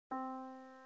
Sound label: Yamaha Disk, piano sound